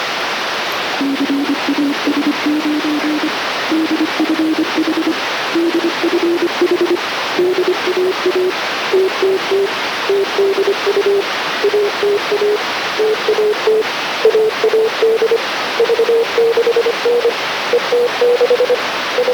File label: XW3 CAS9 Telemetry CW full